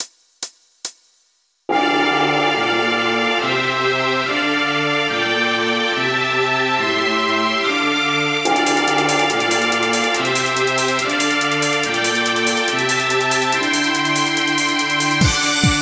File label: forest of wind Inst